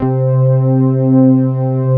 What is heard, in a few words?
synths; Pro-Rec - Synthsonic